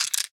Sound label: Marbles Rattle